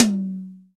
small reverb tom drum one shot f sharp key, tom tuned to the F# note